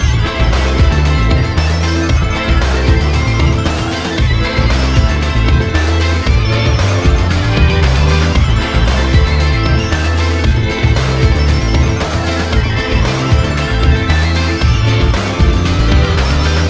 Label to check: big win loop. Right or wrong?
Right